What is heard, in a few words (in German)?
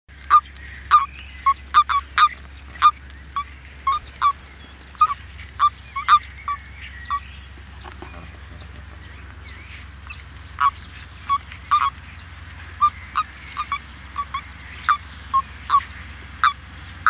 Erdkroeten im Eimer